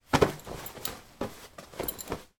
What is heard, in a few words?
clothes trousers fold; foley